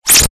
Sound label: science fiction computer monitor turn off; zapsplat